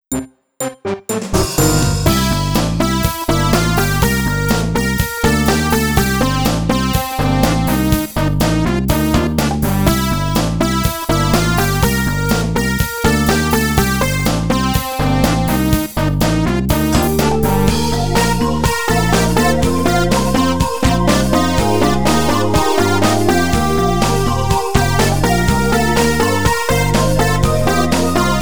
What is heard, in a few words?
Thunder Drum; AliceSoft Sound Album Vol 07 – Galzoo Island